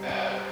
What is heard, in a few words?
Sigh; BB 2HTC Samples Vol 4; Weird